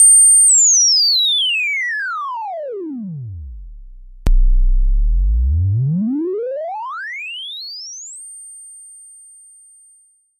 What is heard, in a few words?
Synth Range Aliasing